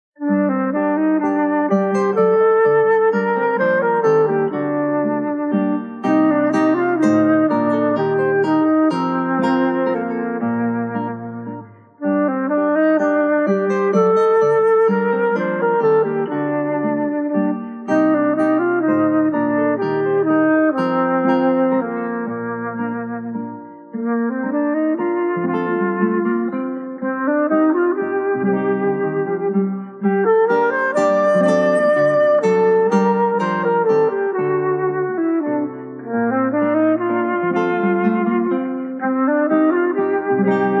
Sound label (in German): menuet, querfloete; charles dieupart